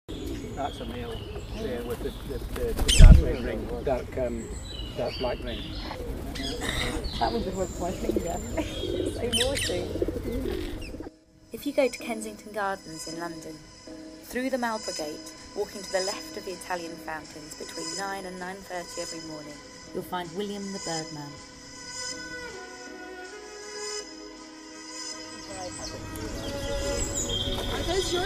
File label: swallow naturedoc